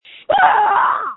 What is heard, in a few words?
screams